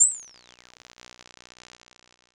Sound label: Science Fiction Sci-Fi Electronic Static